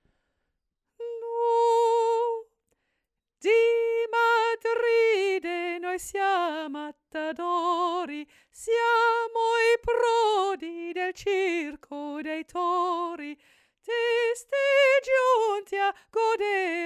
Introductie tutti bas; koorleden

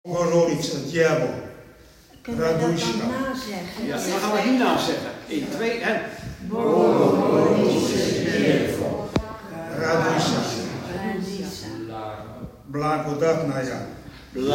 uitspraak sopraan en tenor; Bogoroditse; koorleden

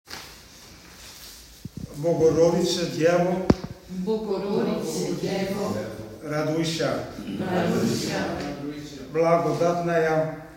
uitspraak Bogoroditse Alten; koorleden

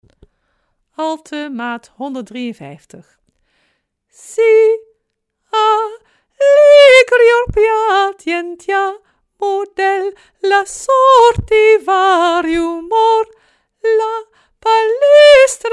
A maat 153 177; koorleden

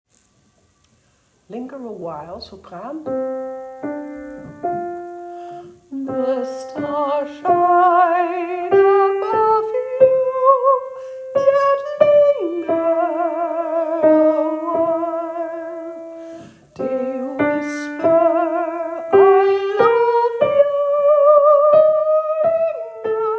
sopraan; koorleden

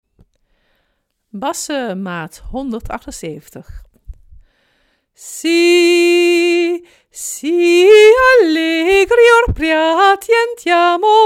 TB maat 178

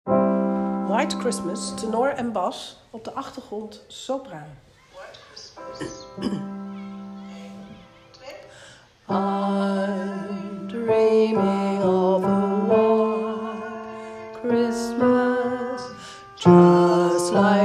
tenoren bas, koorleden

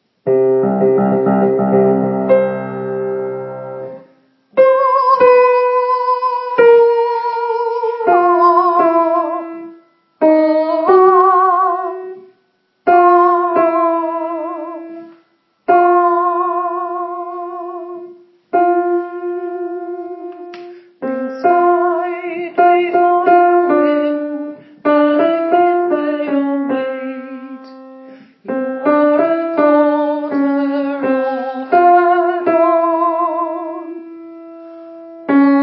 alt, koorleden

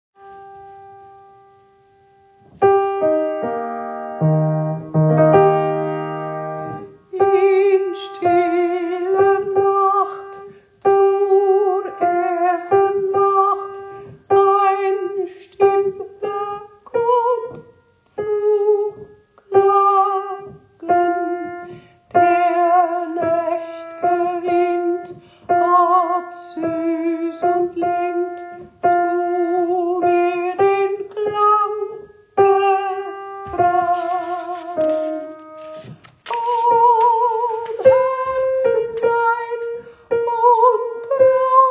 sopraan

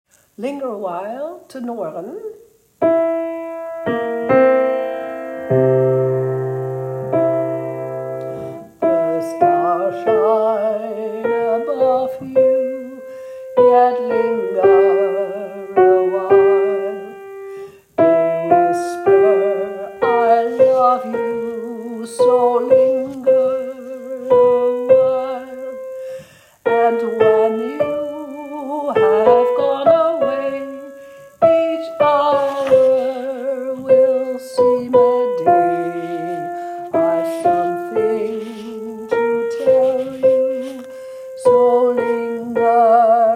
tenor, koorleden

